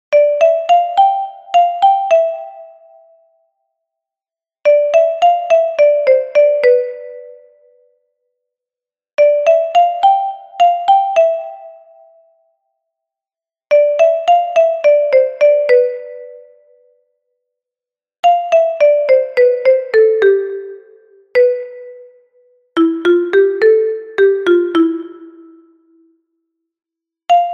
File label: kalimba